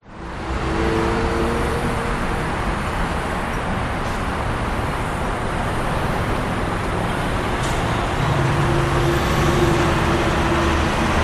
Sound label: USA Interstate 15 in Las Vegas